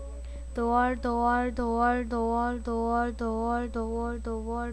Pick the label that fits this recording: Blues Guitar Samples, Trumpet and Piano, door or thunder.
door